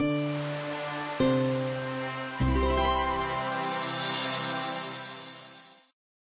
Fishing level up

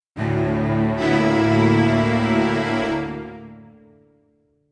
strings; undead overlord linux macos windows gamerip 2014

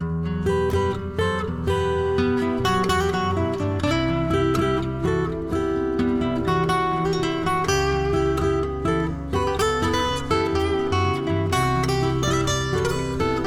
sad reflective; The Confines of the Crown

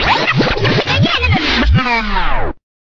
Retry Jingle; Friday Night Funkin Shaggys Thoughts ost mod windows gamerip 2022

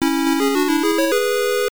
All Clear; WonderSwan of Puyo Puyo Tsuu Soundtrack

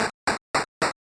Footsteps; Elfaria 2: The Quest of the Meld; SNES

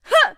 Attack vox

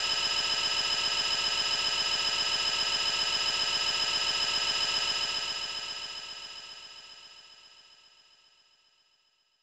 Burglary Alarm; bonkers; snes

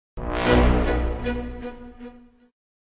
Sting; BGM Hood; Godfrey Track

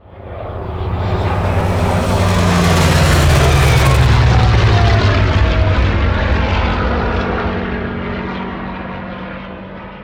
Vehicle Plane Bombing

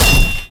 Hit Combo

